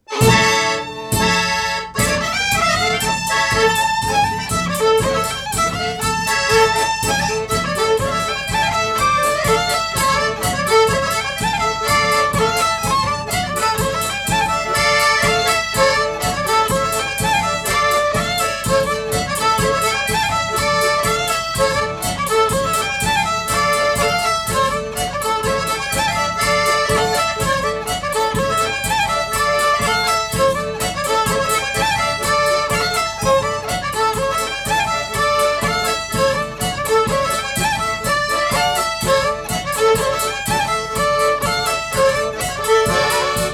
Powiślak od Kroczowa

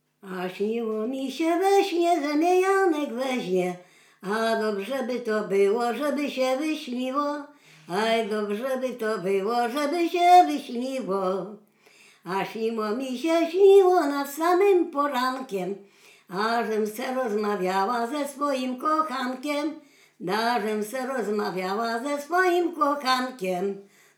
a śniło mi się śniło przyśpiewki; od wujka

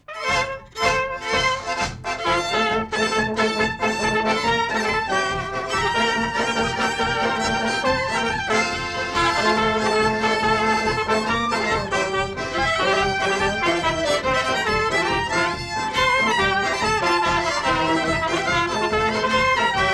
krakowiak

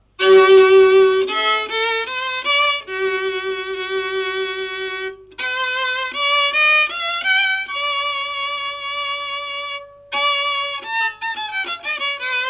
walczyk

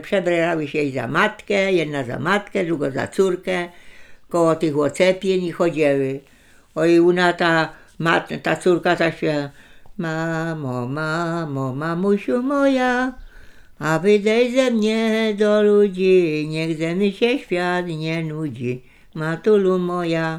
przyśpiewki oczepiny

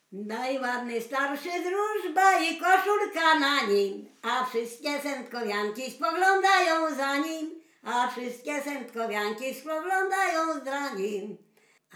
przyśpiewki do drużbów

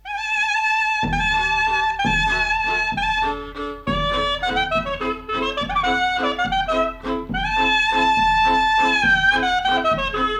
oberek

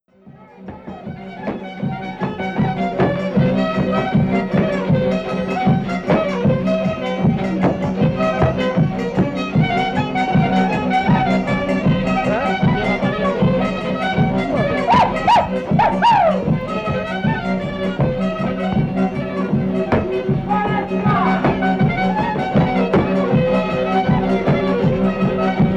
krakowiak; kranów

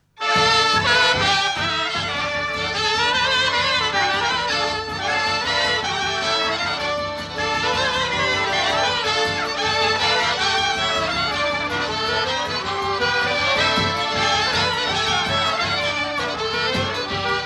kapela polka

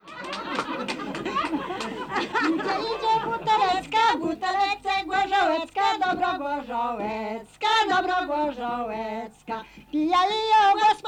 dobra gorzałeczka

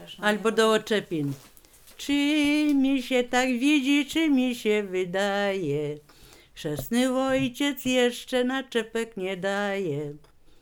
oczepiny

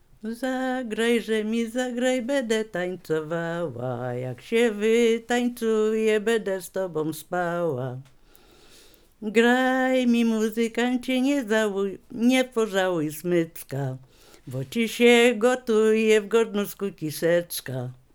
przyśpiewki

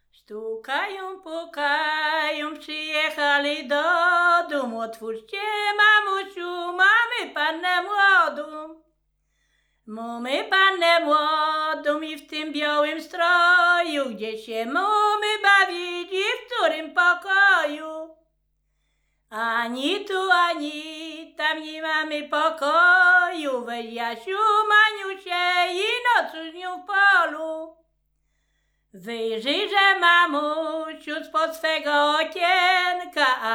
wesele stukają pukają

